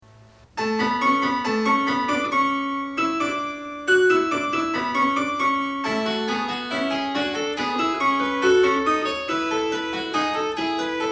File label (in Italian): fuga; bach; bwv888